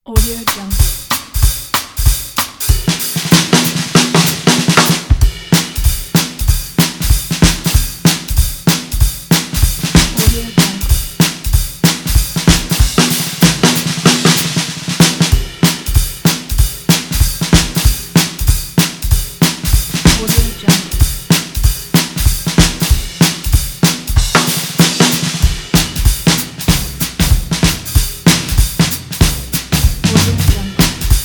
the jazz drums solo

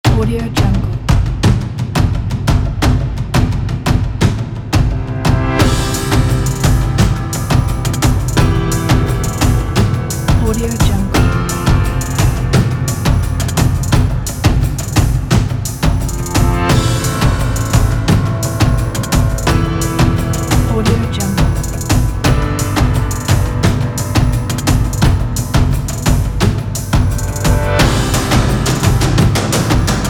drums piano

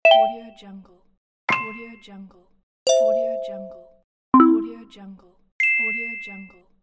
gentle click

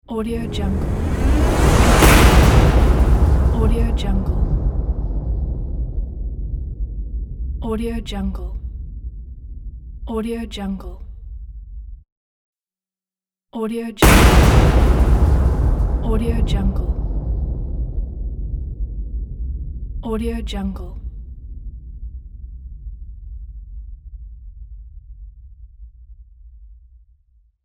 rise and hit

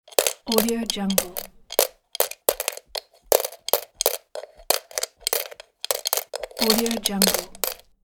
coins in piggy bank

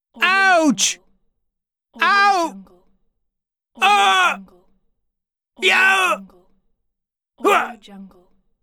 pain shouts

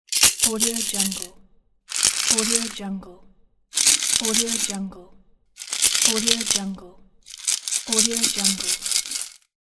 piggy bank shaking

